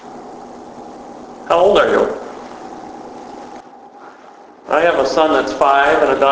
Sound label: Dining room